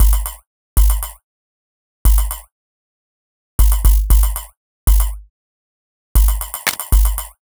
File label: Robot Thunder, Modular Electronica